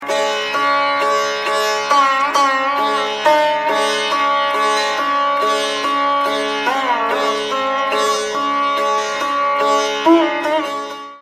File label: Alap, Alap-Jor, Raga Mian ki Todi; North India; Gat